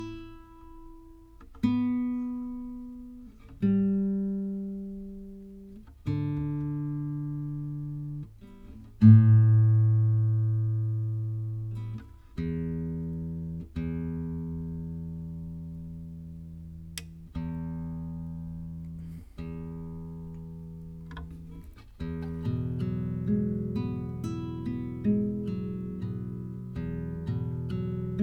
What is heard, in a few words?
Tape GTR